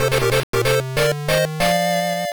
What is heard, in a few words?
win jingle; Kamen Rider 555; pico gamerip 2003